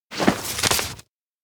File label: box take paper; anemoi